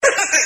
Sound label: laugh cartoon; ooh ahh